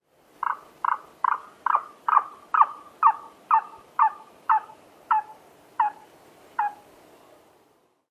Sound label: call series